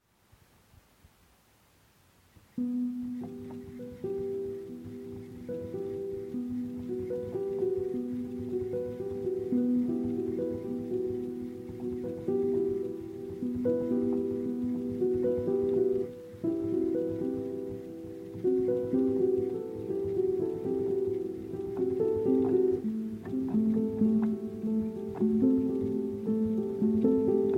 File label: Trance Piano